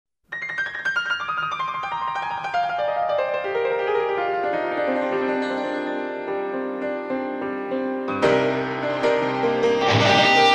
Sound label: instrumental, metal